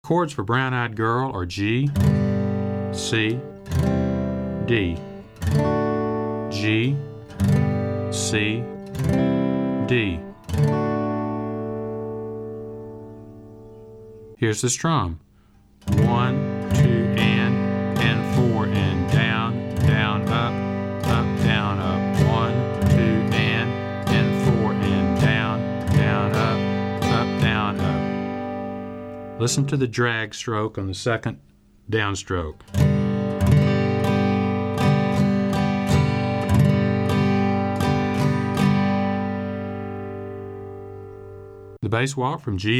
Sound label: Chords